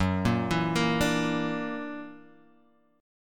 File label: F sharp Augmented 7th, Guitar Standard; arpeggiated; 2,1,2,3,3,x; JGuitar